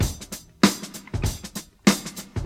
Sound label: 98 bpm drum loop sample g key, Free drum beat - kick tuned to the G note. Loudest frequency: 3048Hz